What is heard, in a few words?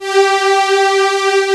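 strings G5, Roland, Analog; USB Soundscan vol.49; Analog Fantasies; AKAI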